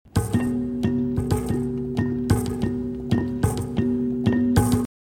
Corde Preparate Martelletti Prepared Strings Hammers